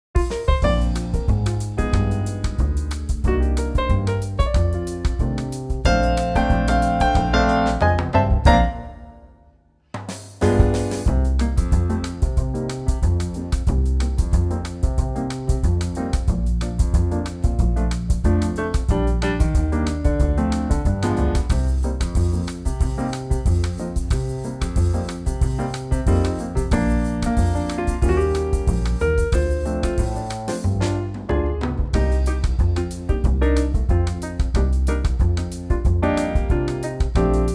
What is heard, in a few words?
Bb Trio; version 2 bossa